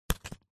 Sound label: papadum set down stack on another